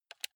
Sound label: console controller playstation press